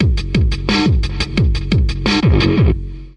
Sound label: 088 bpm hard straight disto grow, drum loops and breaks